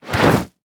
Foley Footstep Work Boots Dirt Debris Slide; Foley Footsteps

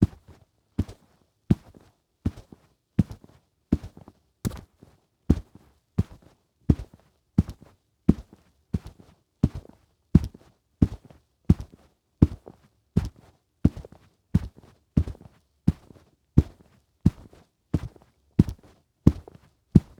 Foley Footstep Work Boots Rug Carpet Walking; Foley Footsteps

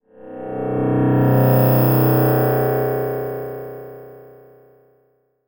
Transition Delay Medium; Production Element Title Transition

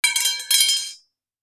Horror Scary Pipe Copper Dropping On Cement

